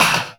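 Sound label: snare drum, Drum & Bass, 150 BPM; Ueberschall; Drum & Bass Resonance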